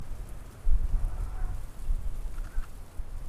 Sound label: cemetery